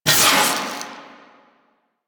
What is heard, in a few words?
Proj Tap Whoosh; Warframe; The New War; Quests; Weapons; Omicrus